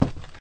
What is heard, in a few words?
wood footsteps